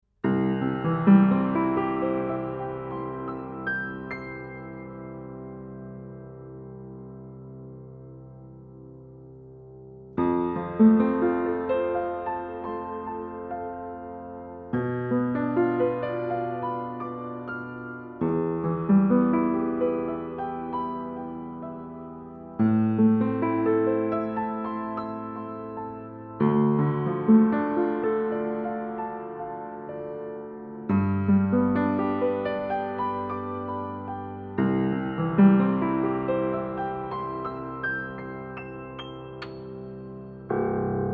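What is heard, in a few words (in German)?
DPA 4060 Piano Kugel Metallscharniere Rückseite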